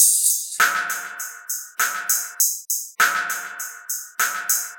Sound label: Tissue Tear Beat; Electronica; Composite Beats; Sound Effect Library